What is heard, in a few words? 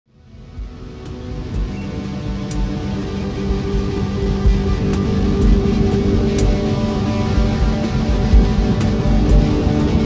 sea noise